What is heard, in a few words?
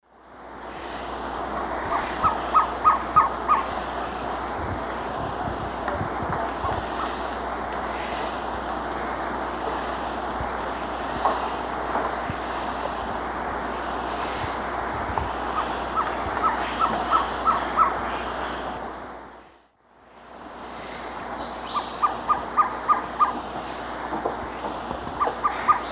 Trogon violaceus, song; S. America; Eastern Lowlands